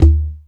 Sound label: Toms, Real